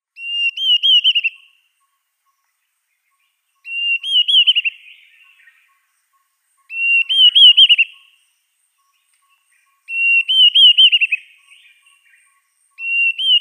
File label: Dideric Cuckoo male display